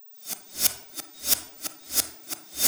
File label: Cymbol Particle; Particles